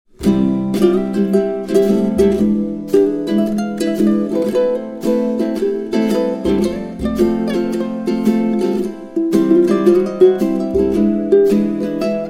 Hawaiian Aloha Melody

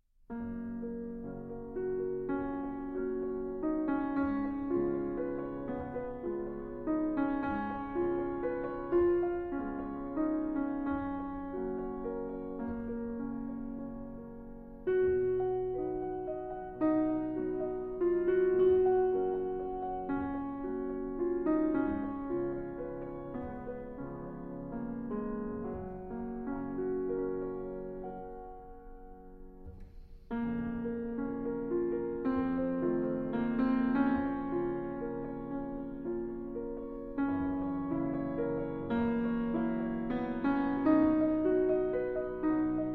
Waltz in G-Flat Major; Schubert; D. Anh. I14 Kupelwieser-Walzer; Transcr. R. Strauss

